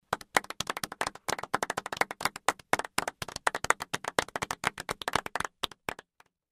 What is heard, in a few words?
Golf Clap, Applause; 1001 Sound Effects